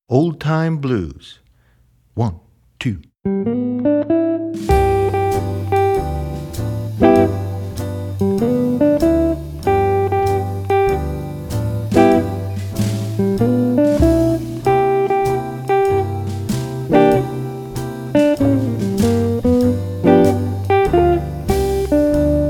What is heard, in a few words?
Old Time Blues